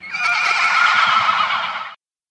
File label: monster unicorn